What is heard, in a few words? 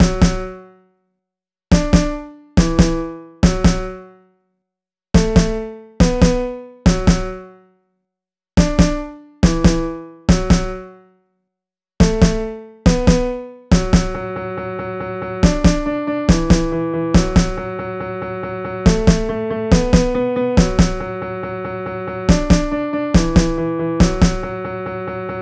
8-Bit